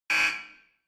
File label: Electromagnetic Buzzer Room